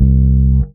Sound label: DM BAS, Dance Mega Synth; Best Service